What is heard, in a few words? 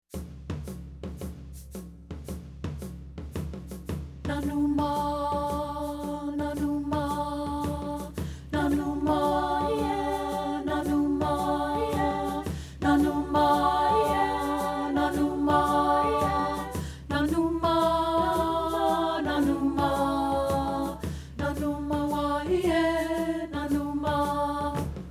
alto